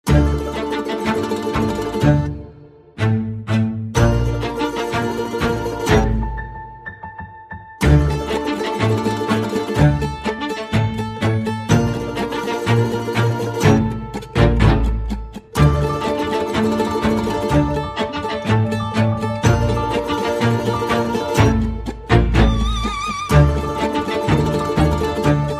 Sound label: No Ticking Percussion